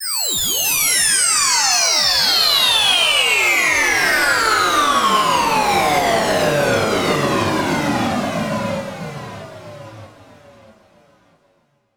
Downlifter

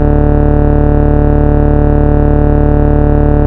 Bass D, Root D

